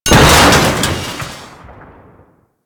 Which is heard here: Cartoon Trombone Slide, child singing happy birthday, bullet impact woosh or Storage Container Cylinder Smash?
Storage Container Cylinder Smash